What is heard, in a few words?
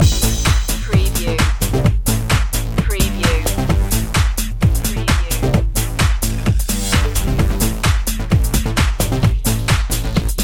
longer loop